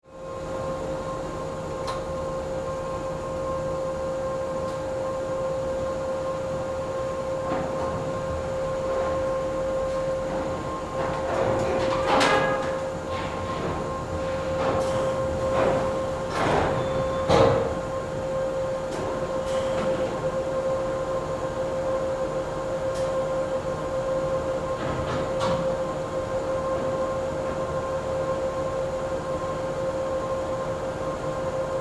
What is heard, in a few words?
Toe In Machine, Industry, Factory, Toe, In, Machine, Front, End, Alignment, Assembly, Plant; Stamper; General, Motors, Gm; BlastWave